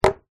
food drink empty take away coffee cup down on surface